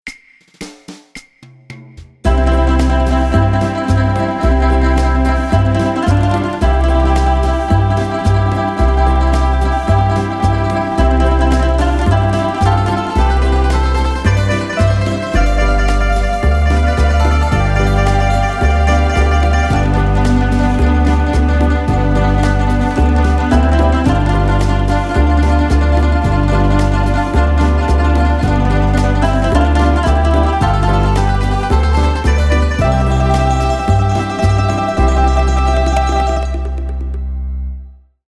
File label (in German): Mandoline Beguine; Wersi